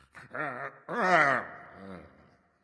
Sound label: Defeat Vox; smite; kumbhakarna